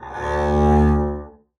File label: arco d2 ff up, double bass; dsmolken